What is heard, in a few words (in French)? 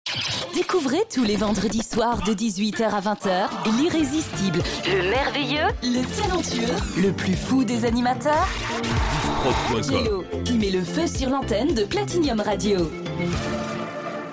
Radio Jingles; Platinium